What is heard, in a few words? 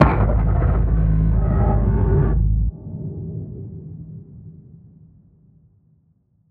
Alien Turret